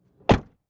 Chevy Trailblazer Close Door Exterior; transportation

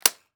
Weapon Gun Machine Gun Thompson Sub Clip Slam; AdobeCC

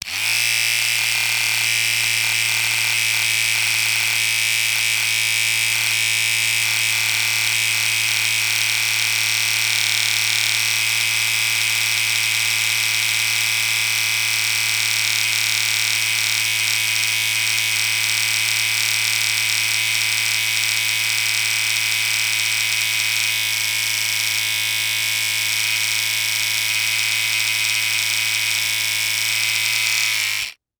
Technology Electronic Electric Razor Idle

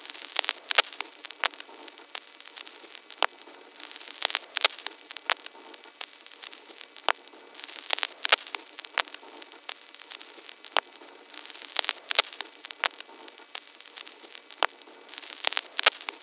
pop loop, Vinyl; Cineblur

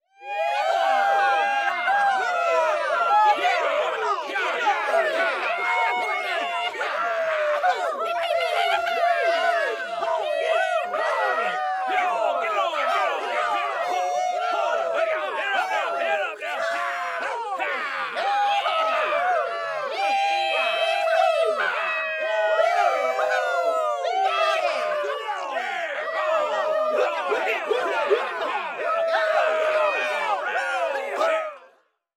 Human Crowd Cowboys

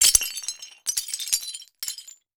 Ceramic Debris Falling On Cement; Crashes

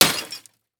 Impact Bat Hit Broken Television Glass

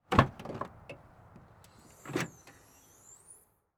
Transportation Chevy Trailblazer Open Trunk

